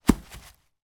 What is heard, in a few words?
impact log branch fall hit forest floor